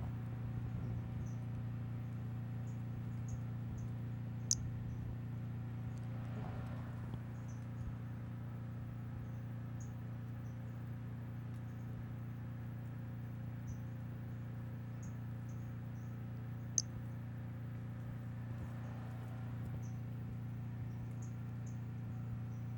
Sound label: Brachycephalus albolineatus